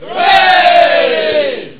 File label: hooray; Global Domination v1.01; Impressions